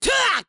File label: Attack